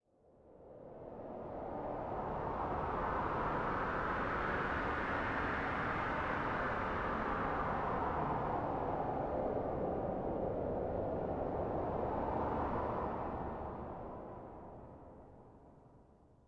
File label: ambient